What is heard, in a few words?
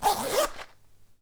zipper